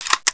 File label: f2k mag out, weapons; valve